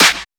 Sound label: D&B Clap, Drums Hits Claps; neuro